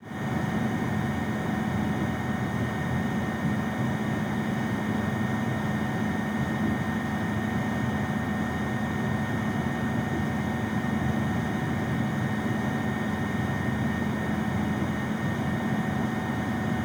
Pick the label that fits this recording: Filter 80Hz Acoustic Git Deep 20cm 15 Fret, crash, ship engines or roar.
ship engines